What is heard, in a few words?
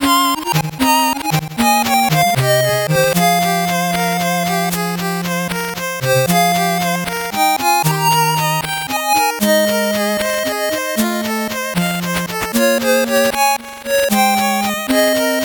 8bit bgm fast